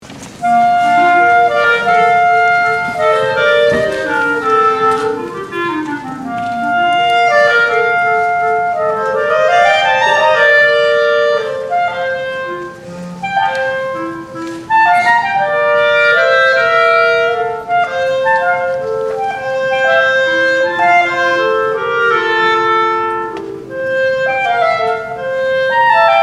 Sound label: six duos, Deux Clarinettes; duet; Johan Fredrik Grenser: "No. 2" from Six Duos pour Deux Clarinettes; Collegium Musicum